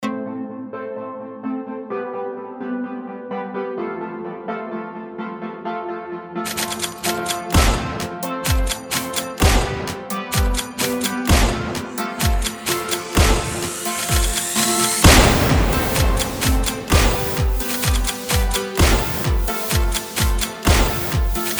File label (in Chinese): 卡点快闪快手抖音都市风格98k枪声短配乐